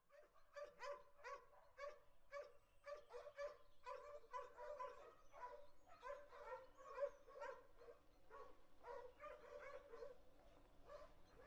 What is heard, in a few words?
dogs and car DirAC 5 1